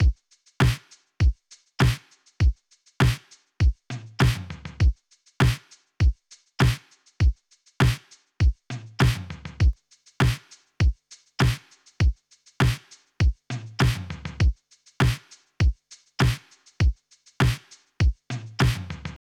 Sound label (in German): Kompressor 20 1